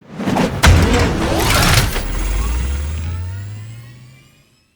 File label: Mount, Vehicles; Grineer Dargyn